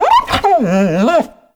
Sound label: Kubrow Tame Loot Fail D; Warframe